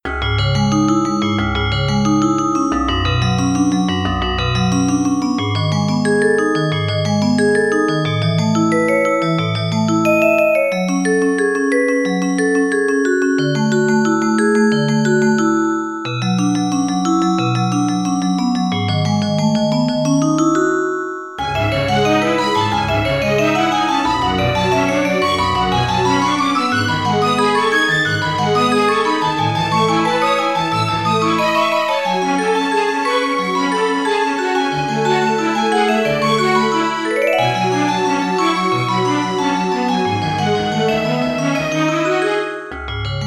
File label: high atop the clock tower, chiptunes